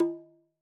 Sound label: Udu Skin